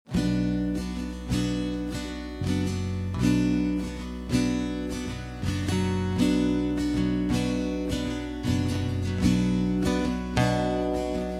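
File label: B minor No Lead Guitar, backing tracks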